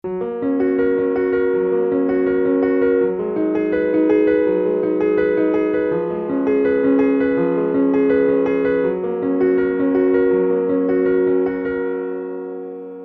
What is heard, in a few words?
Prelude F sharp Just